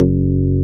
PLOP F#1, BASSES

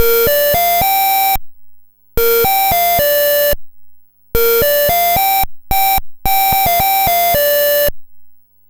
Apple II Synth, Chiptune; Sonic Foundry (Sony Creative Software)

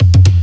TOM FILL; Ragga & Dub Reggae; TOMS KIT; USB Soundscan vol.27; AKAI

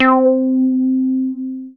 MOOG BASS C4; Best Service Dream Experience

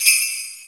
J bells, Percussion Orchestra; Giga Samples Collection

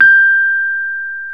PNO, Rhodes Mk.V; Keyboards of The 60's and 70's

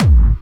hard bass drumz

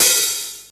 HAT OP, DRUMS; XR10DRUMS; East West; Global Sounds

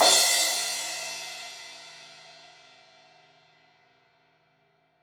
Crash 16in edge, Cymbals; Deeply Sampled Drums

